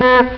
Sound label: BIKE HORN